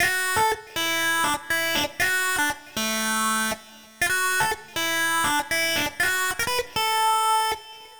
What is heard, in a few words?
Solo Synth, 120bpm D; Zero-G; Nu Disco; Happy People